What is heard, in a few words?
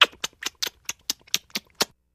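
Kisses Up Arm Repeated; kissing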